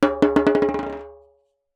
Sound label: Jembe Bounce; Percussion Samples